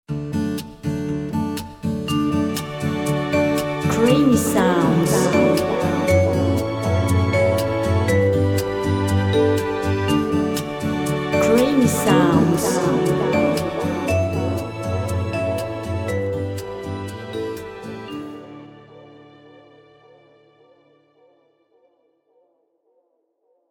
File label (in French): creation musicale nature romantique